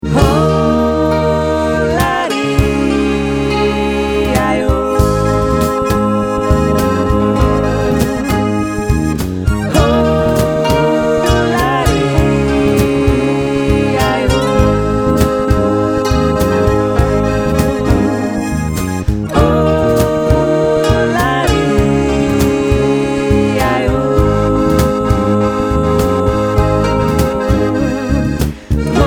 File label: Jodler